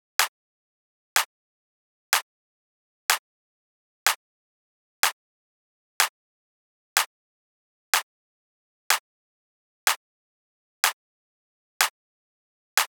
Clap Break D

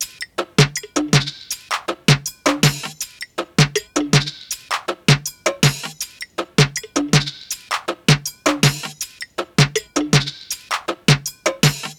drum loop afro no kick 80

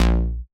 Synth Bass, A-

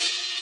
OPENHAT

